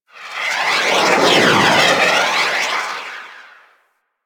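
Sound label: Mortar Projectile Incoming; Warframe; Venus; Venus Terra Mortar Moa; Abilities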